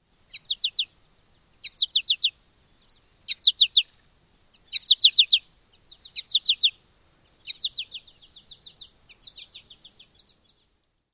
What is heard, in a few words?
per tee tee tee flight calls